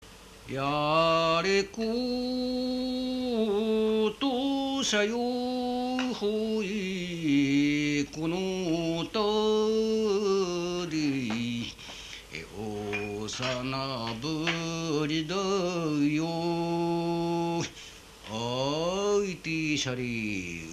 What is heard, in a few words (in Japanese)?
taueuta, minyou